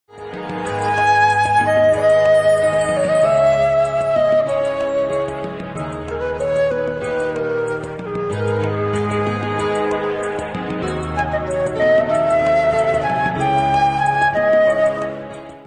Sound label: baroque, Baroque Minor Flute